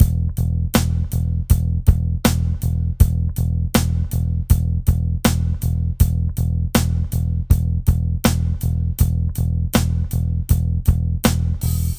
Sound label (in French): Impro modal rock E, Guitare, Playbacks